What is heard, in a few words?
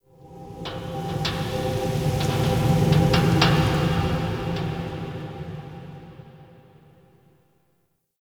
Park Wind; Warframe; The New War; Zariman